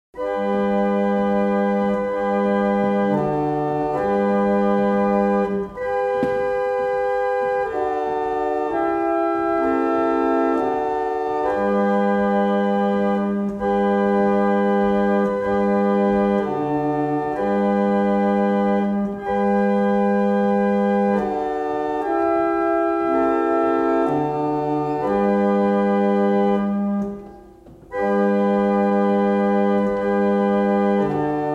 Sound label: Single chant, barrel organs; Wardley; Tallis